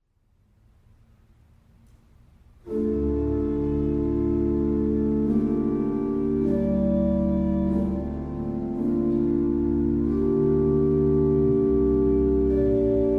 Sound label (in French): Interlude orgue